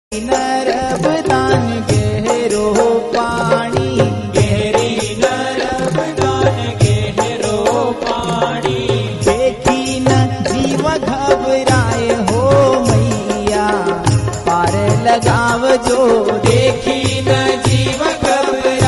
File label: romantic gujarati